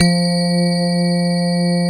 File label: GRAND PF F 2, AKAI S-Series; AKAI S-Series CD-ROM Sound Library VOL-7; D.GRAND PF